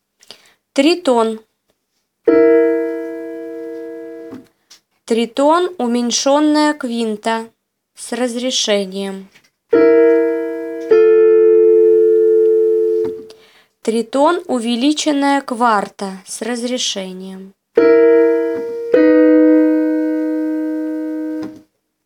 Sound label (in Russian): Тритоны; 4 kl solf